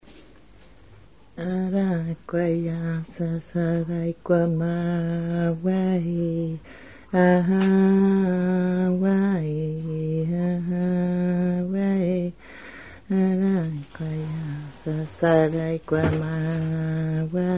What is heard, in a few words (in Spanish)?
Cancion